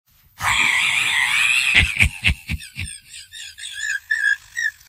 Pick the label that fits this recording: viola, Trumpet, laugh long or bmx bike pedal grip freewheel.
laugh long